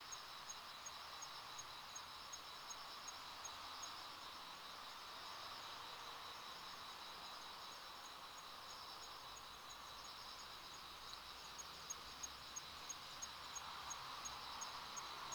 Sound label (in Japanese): kanetataki